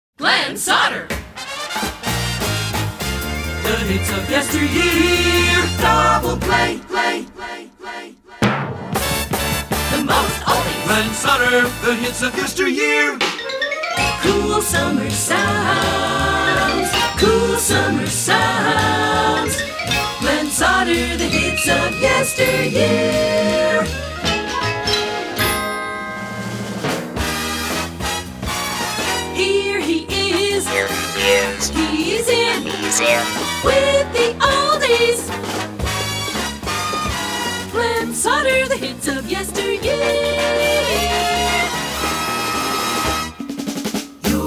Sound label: Jingle; Short Attention Jingle Show IV